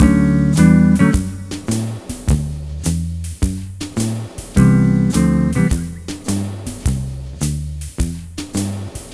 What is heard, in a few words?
Multi Guitar Swing Mix-D, 105; Zero-G Kocktail Kollection; Construction Kitz (Lounge)